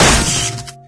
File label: neon sign hit world; tf